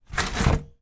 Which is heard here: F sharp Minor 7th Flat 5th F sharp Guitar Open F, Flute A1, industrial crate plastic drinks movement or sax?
industrial crate plastic drinks movement